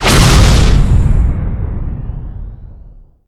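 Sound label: whoosh aerial; gundyr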